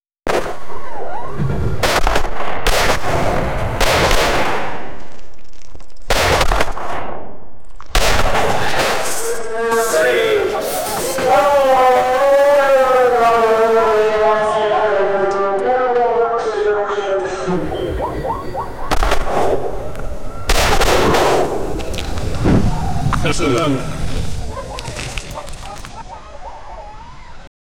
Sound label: warzone sound with machin, guns